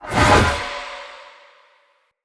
Great Sword Throw Perfect; Weapons; Player; Swords; Mendicant Great Sword

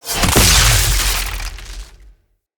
Kuva Lich Requiem Mod Fail C, UI

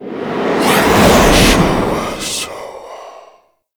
Owl Recon Transition Stinger; Warframe; Duviri Murmur

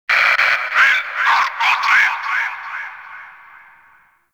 Interior Notification; Warframe; Grineer; Fortress; Ambience

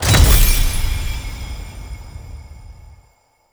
Endless UI Rewards; Duviri

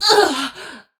Death; Warframe; Tau; Dax; Ninja

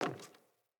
Sandals Walk Wood, Footsteps

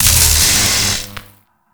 Alt Orb Zap; Warframe; Tenno; Grimoire

